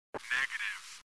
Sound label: sound negative